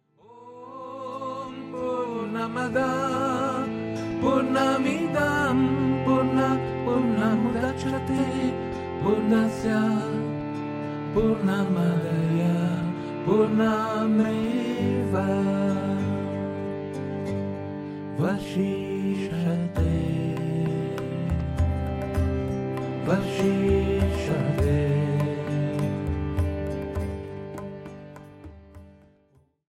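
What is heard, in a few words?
Om Purnamadah, kirtan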